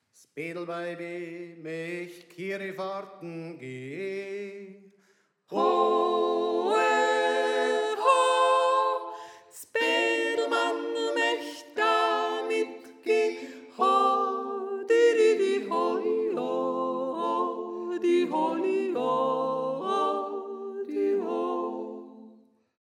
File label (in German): Sopran; s Bettlweibe Möcht Kirifahrtn Geh